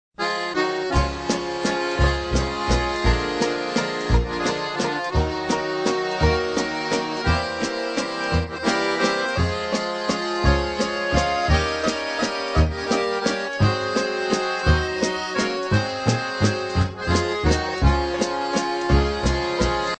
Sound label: WINTER LULLABY WALTZ